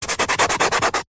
chalk rub erase hand finger chalkboard; office

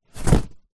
sport exercise mat foam thin rolled up set down

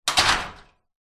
hospital door metal security close

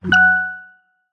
game tone soft synth slightly dull generic notify

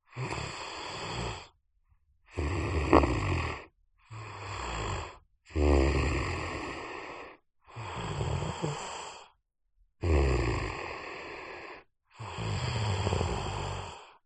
fantasy giant child snoring

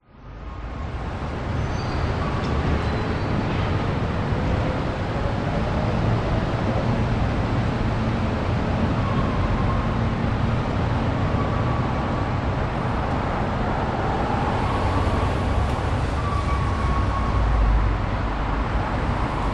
brisbane cbd traffic slip road midday; m3 gardens point road

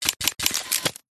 Detailed Mechanical Morphs Mutations; PMSFX

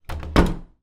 household door internal wooden close med old; zapsplat

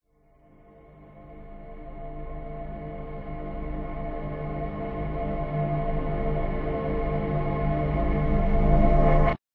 horror riser slow eerie dark

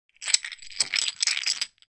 playing discs movement in hand; connect 4

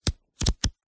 household book workbook pages flick through

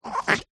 cartoon squeeze pull squeak rubbery squelch

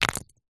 stone roll across rock

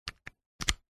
technology micro usb cable plug in; zapsplat

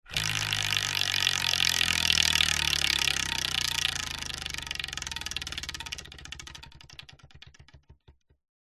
leisure wheel of fortune wooden spin

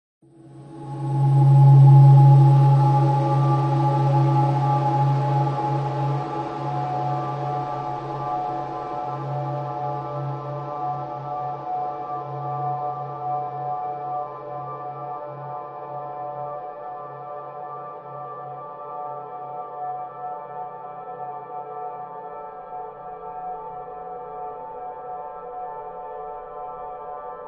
sound design drone atmos cold desolate eerie; zapsplat

